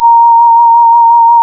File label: Synclav FM, WIND, A#; Big Fish Audio